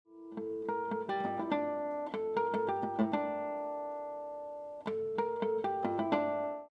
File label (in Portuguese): Harmónicos naturais, em scordatura